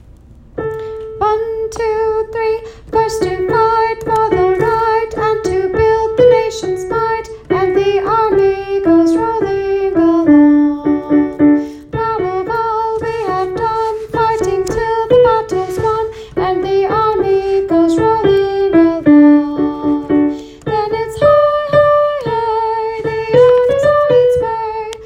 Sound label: soprano; Army; military songs